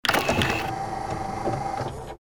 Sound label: electric door